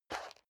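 step leather shoes concrete slide short, footsteps; schritte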